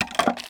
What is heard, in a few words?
bucket foley; tusk